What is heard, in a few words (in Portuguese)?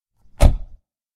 porta fechando